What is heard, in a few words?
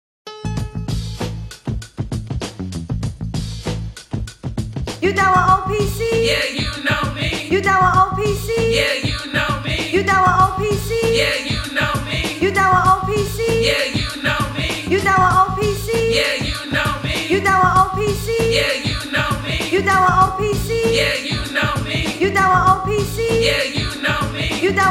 Chant